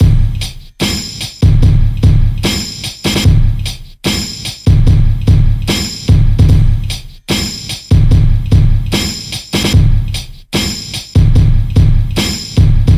Drum Loop 74 bpm

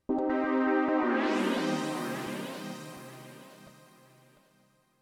Stabz, FX

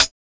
Closed Hats

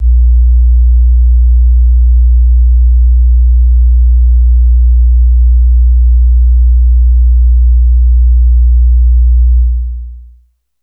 Sub, 808s

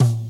TOM

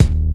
BD; 808s

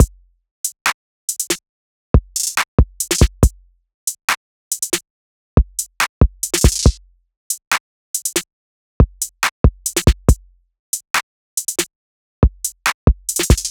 beat loop full 140

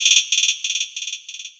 Hat Closer; Closed Hats

